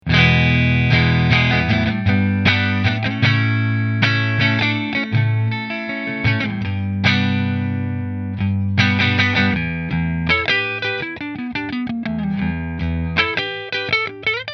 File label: Melancon Neck Mini Position 2 Fender, guitars